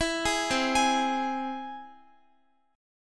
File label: C Augmented down Guitar; arpeggiated